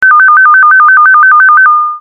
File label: chime; pictcode